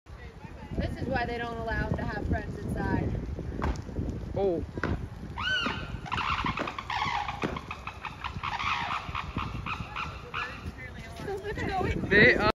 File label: Golden takin